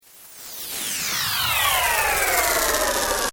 ENERGY PULSE, Created energy weapon effects; Rise And Dive; Rises and Dives; Sound Design

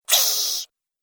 Gremlin Fangs, monster; Mr Fangs; horror

